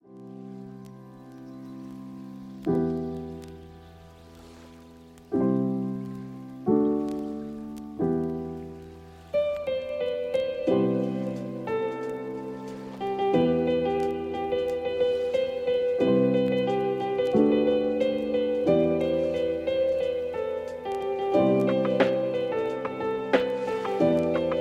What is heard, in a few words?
Instrumental Lo-Fi